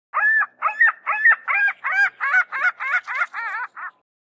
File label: gull; Adventures in Lestoria; action RPG game; Sig Productions